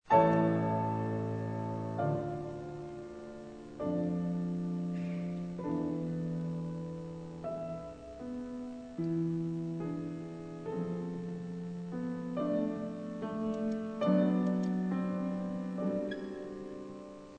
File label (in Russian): fortepiannoe wstuplenie